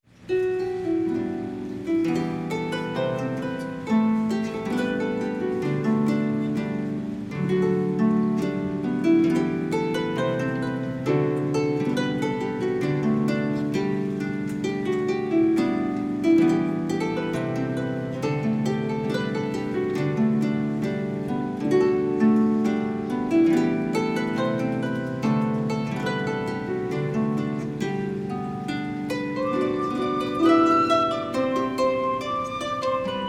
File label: Harp